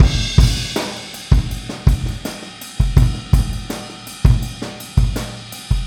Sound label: Boogaloo Chorus Kick, 82 BPM